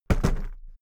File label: briefcase plastic drop heavy bouncing; industrie wirtschaft; bueroarbeit